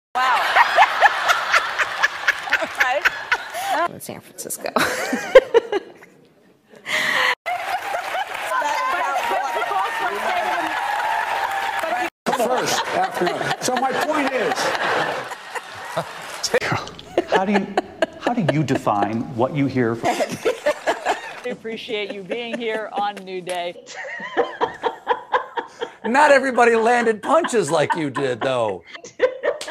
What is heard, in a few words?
Laughing Compilation; Kamala Harris